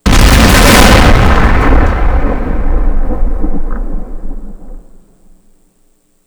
bridge blows bomb; WAR

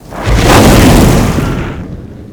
vehicle death explosion combo; WAR

